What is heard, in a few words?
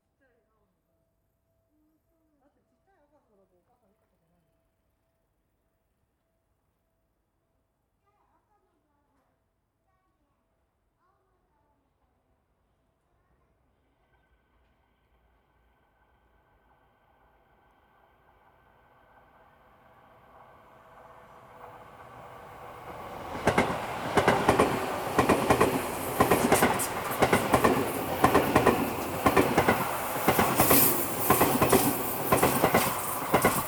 Stereo, walk